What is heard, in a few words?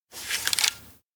projector touch; anemoi